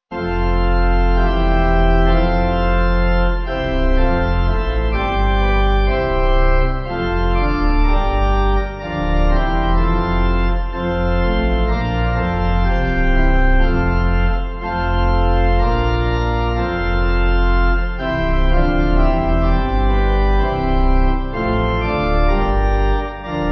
Pipe; Warum Sollt

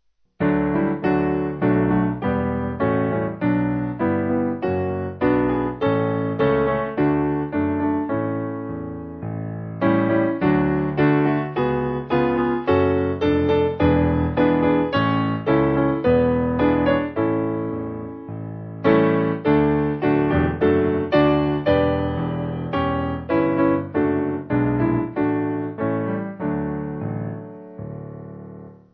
Piano

